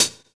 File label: Circulate HiHat; Sonic Foundry; Drums & Drones; Construction Kits